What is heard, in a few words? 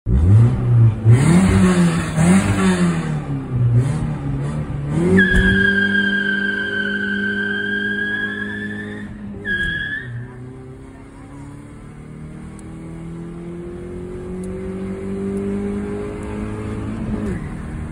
Turbo; Suren-Turbo; تقویت و تیونینگ